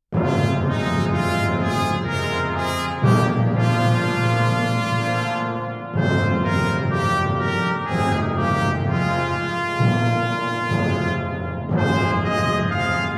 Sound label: Fanfare Orchestra; Fanfare Orchestra of the Castle Guard; Intarda Resurrectionis Victimae paschali laudes